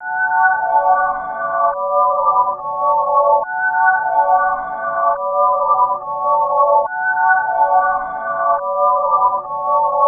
WESTLOOP, Melody Loops; TRUTH-HZ; Crowdsourced Sample Pack

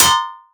Snare Metal A# Low; Crowdsourced Sample Pack; Drum Kit; Claps & Snares

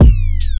bweeew; Truth-Hz - 1-Hit KO Sample Pack

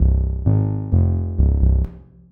SERUM bass; Crowdsourced Sample Pack; MESSING WITH SERUM bass

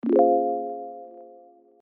Clavichords; Piano & Keys; Crowdsourced Sample Pack

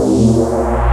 oa whooa; Truth-Hz - 1-Hit KO Sample Pack

